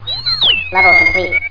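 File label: level complete; chomper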